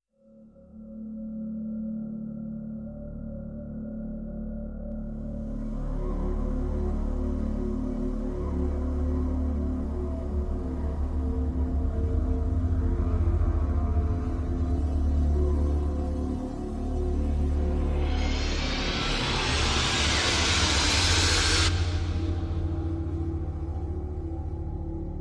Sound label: Drones